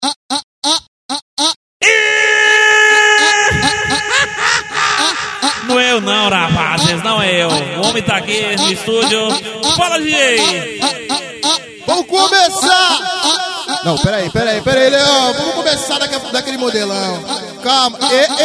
funk ritmado; especial cuiaba

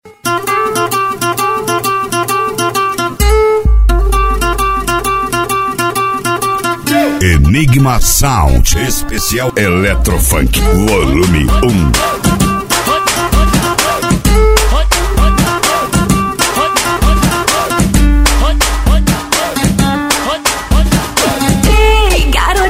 especial eletro funk

